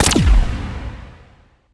Implode

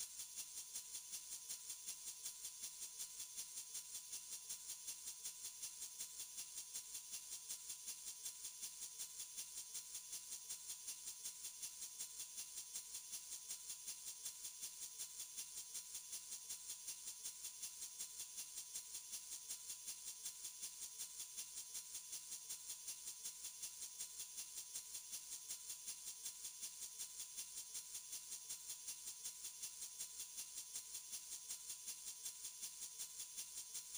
Town Shaker; backpack hero gamerip 2022

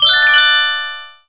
congratulation

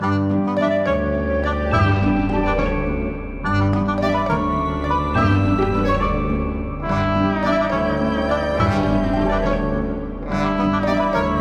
Game Music; Cut the Rope Arcade

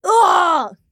flyer death

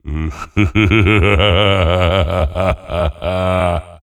Laughter

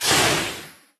Jump; Hero Tramin

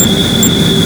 ammo flyby grenade

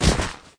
Footsteps

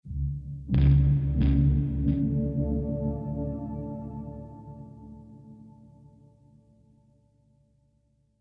Lap Steel Rhythmic; The Last of Us; ML Lap Steel Rhythmic Mood

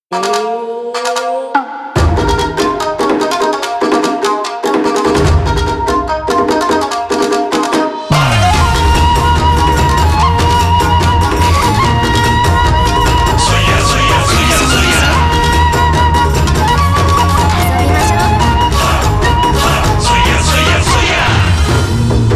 Karaoke; Like a Dragon: Ishin

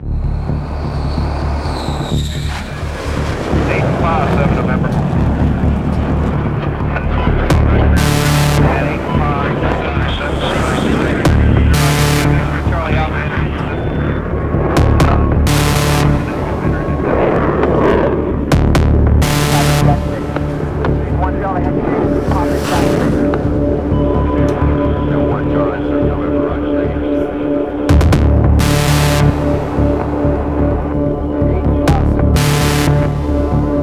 Jet Music Loading; Battlefield 3

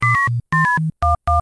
phone; Draw Something

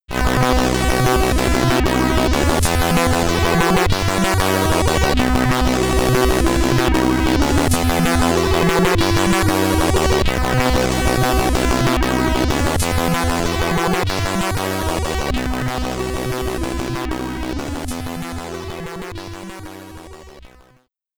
Name Entry, SID Stereo, Commodore 64; Edd the Duck

